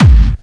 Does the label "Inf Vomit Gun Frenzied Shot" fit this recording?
No